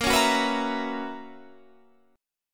B Major 7th Suspended 2nd Suspended 4th Bb, chord shape